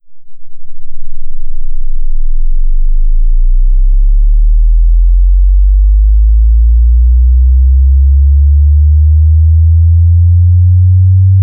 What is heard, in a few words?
Linear Tone Sweep; subwoofer